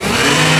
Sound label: saw cut start, circular saw; weapons